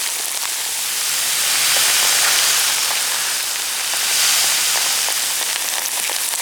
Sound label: acid gas loop; Warframe; Corpus Gas City; Gameplay Remaster; Ambience